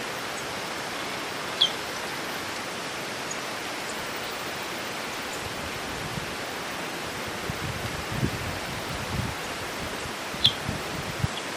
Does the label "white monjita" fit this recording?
Yes